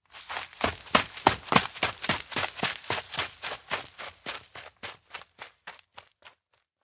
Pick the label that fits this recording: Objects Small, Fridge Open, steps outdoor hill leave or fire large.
steps outdoor hill leave